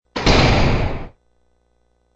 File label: Prison Door Slams, High quality stereo sound of a prison door slamming.